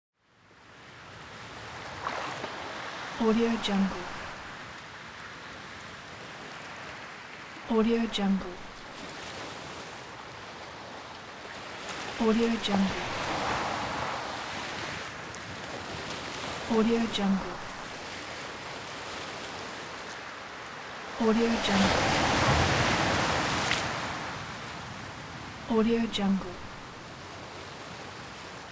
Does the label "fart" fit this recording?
No